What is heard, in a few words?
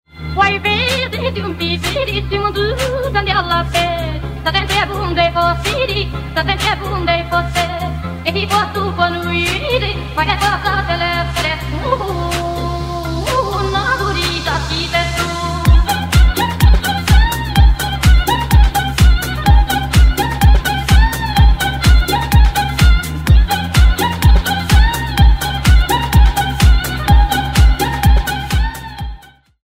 Romanian Folklore